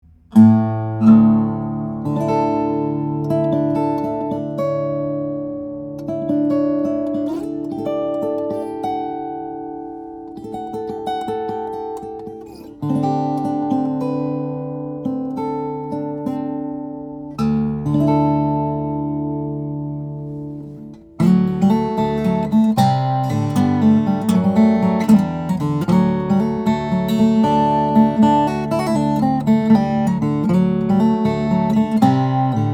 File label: Beauregard OM, steel-string flattop guitars; omc; brazilian rosewood; redwood